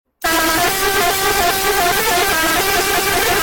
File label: horn